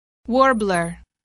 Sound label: warbler